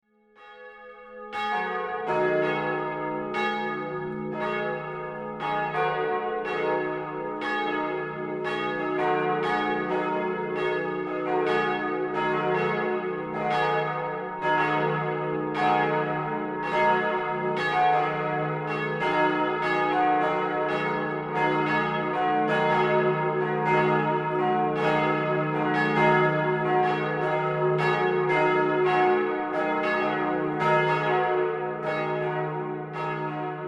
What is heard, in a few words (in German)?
laeuten; soundscapes